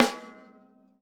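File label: RAW snare drum, Drums Acoustic; AKAI; 90s sample CD; USB Soundscan vol.10; RAW KIT 2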